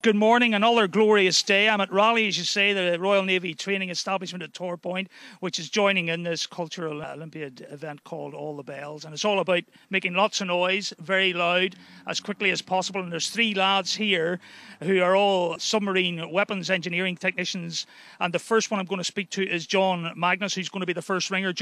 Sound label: olympic bells in cornwall